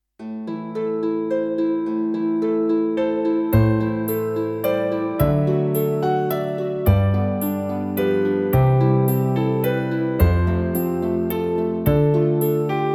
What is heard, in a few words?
piano celtic harp violin, instrumental; greensleeves what child is this